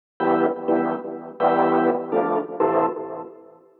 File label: DEEPHOUSE ORGAN 125 A